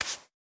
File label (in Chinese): 刷子刷扫声